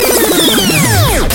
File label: Robo Sms